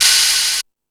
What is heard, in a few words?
FX Sounds, Drum Machines